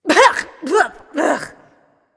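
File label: clear throat, female; smite; voicepack; hera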